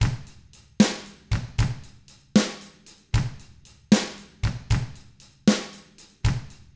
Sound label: Drums, R N B 6-8; ILIO; Double Platinum Drums 1